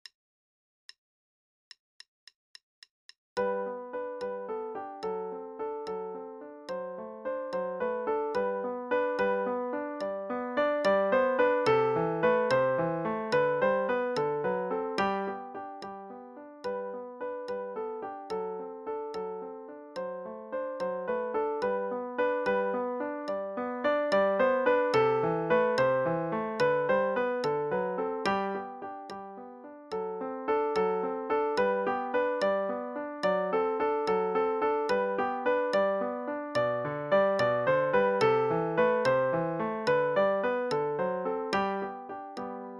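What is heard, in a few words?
Play-a-long, Dance, dotted quarter=73; Czerny; Village Dance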